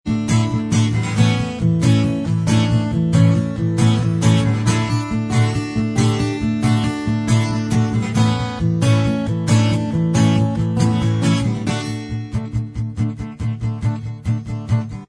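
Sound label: Acoustic instrumental Track, backing tracks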